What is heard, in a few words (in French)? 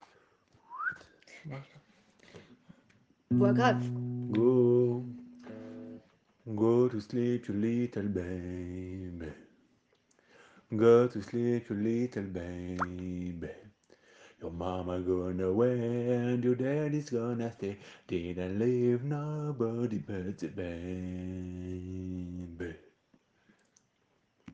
voix grave; chantons